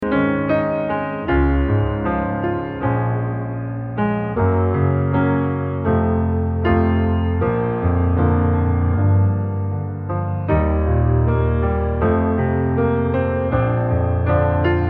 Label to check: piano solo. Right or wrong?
Right